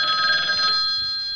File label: ring